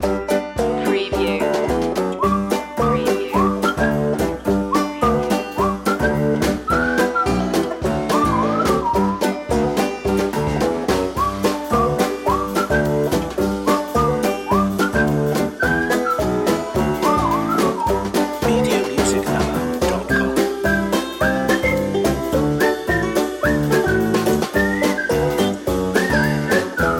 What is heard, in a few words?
shimmy